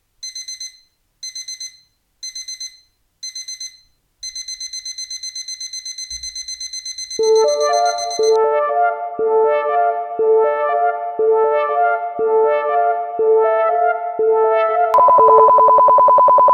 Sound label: ringtone alarm text